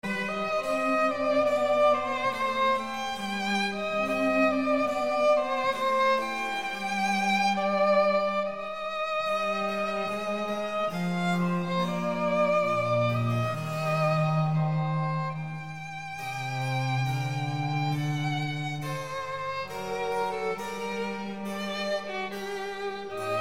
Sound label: Trio Sonata in G Minor I. Largo